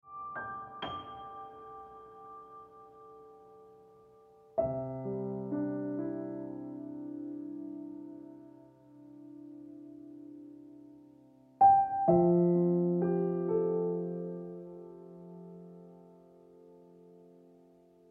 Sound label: Dancing Trees Ringtone Piano Music